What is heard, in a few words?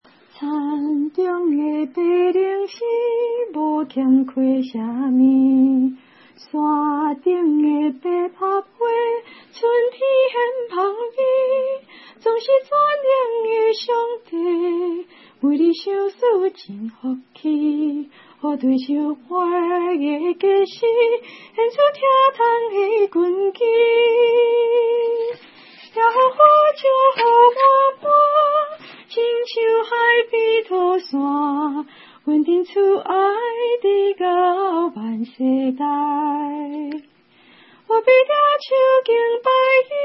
Lady Voice